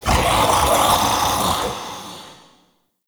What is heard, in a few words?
Male Vocal Attack; Characters; Enemy; Vocals; Mockeries